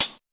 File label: classic hh Wng H, Classic Hip Hop; STYLE KITS (Hip Hop, Etc); Classic Hip Hop Mega Kit